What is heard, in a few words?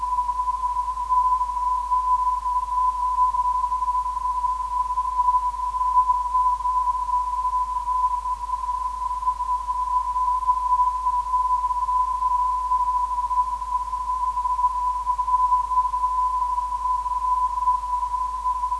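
non-directional beacon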